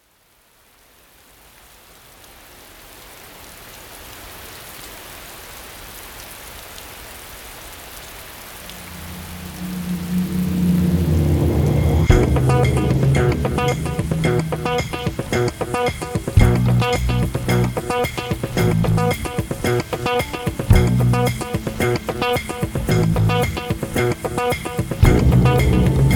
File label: New Drums + Ambience